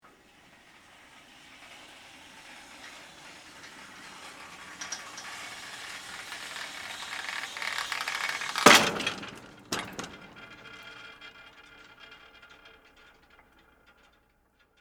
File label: Fly Rail Raise, Foley, Fly, Rail, Raise, Theatre, Stage; Film Set Sound Effects; BlastWave